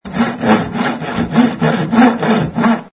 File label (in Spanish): Serrucho sierra